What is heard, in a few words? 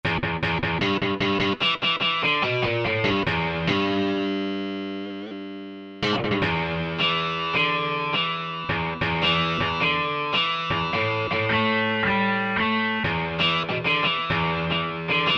Vari-Fuzz, Korg MSC-6S Fretted Insts; Fretted Insts 1